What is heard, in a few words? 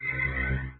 cards; john carter; lost symbol